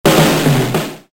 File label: drum